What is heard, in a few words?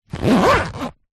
foley luggage case small childs zipper